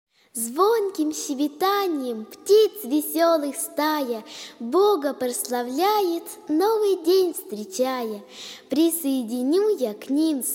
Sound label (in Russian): Детский хор